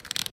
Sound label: reel Start; netent; reelsteal